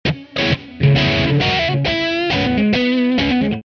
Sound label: Db2 134 chords